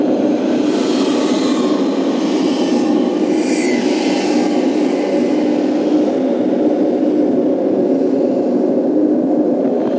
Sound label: tunnel horror; Shattered Horizon